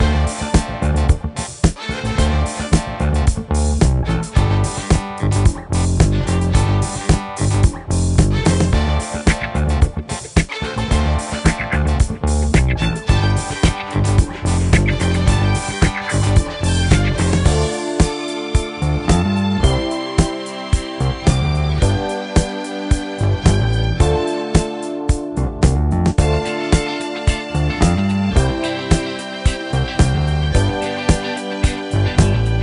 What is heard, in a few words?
Disco; lego dimensions soundtrack; Minikit Arcade Disco (Ghostbusters 2016)